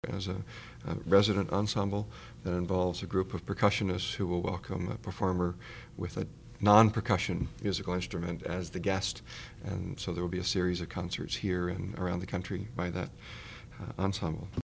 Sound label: percussion